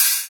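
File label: Dubstep open hi-hat; Hi-Hats; Ghosthack; Dubstep Sound Arsenal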